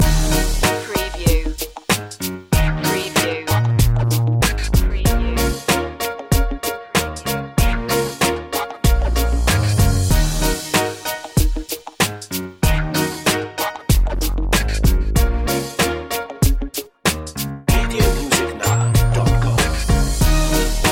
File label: timba love